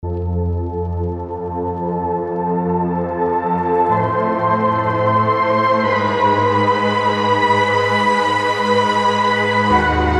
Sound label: Tape Rising Strings; Heavyocity Mosaic